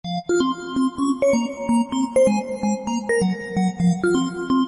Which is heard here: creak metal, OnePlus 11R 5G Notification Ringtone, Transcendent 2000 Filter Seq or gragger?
OnePlus 11R 5G Notification Ringtone